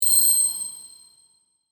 Sonar Blip High, Pitch, Reverb; Communication; BlastWave

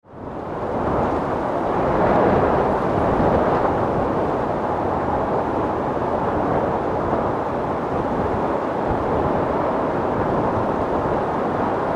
Wind, Storm

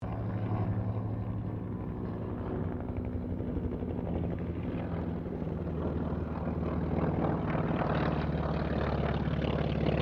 AIRCRAFT BIRDDOG 0-2 TROJAN PASS; Mixed aircraft squadrons; Airplanes; Vehicles

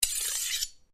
Blade Swish Low, Sword; Foley sounds for melee weapons; Swords (Sound Effects:Combat)

